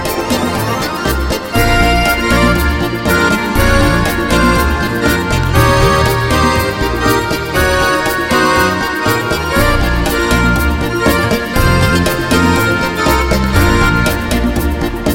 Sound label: Super Hot Song